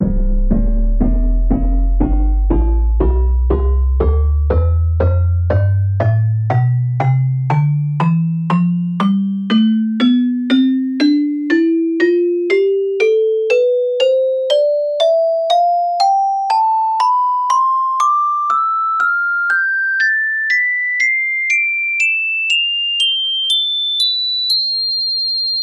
celesta tonleiter; SpectMorph Evaluation #4

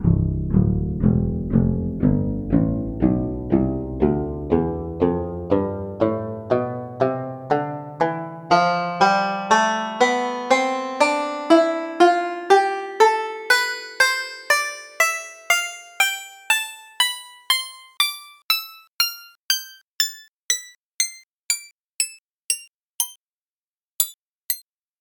banjo tonleiter

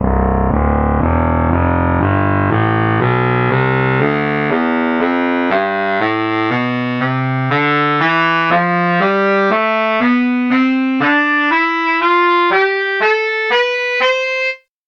baritone sax tonleiter

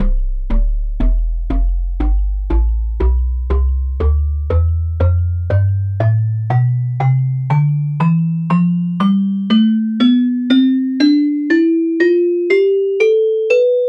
celesta tonleiter; SpectMorph Evaluation #4

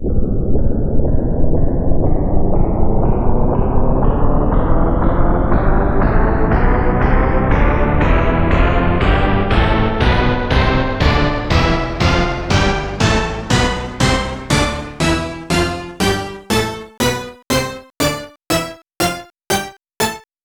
orchestra hit tonleiter samples

